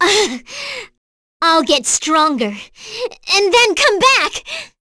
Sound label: Vox Dead; en; voices; heroes; Cecilia; kings raid